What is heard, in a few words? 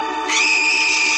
Female Scream